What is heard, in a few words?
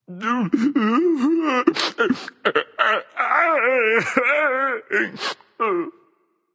woohoo; smite; voicepack; poseidon